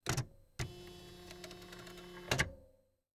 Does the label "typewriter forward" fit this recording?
Yes